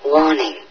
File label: warning; source engine; bshift; fvox